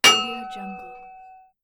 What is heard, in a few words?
metal hit; stormwaveaudio